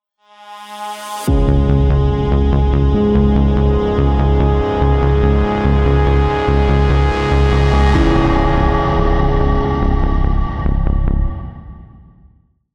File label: lose; radiant